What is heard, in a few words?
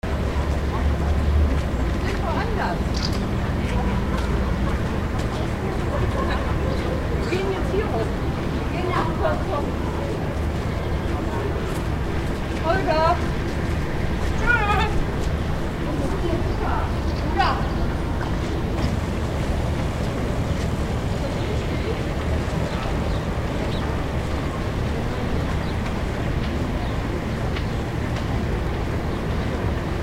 canal bridge; germany berlin tiergarten